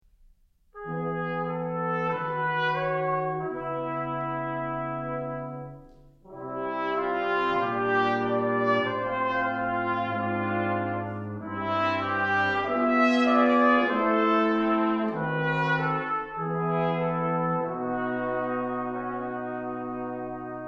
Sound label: Chorale, quintet; aj quintet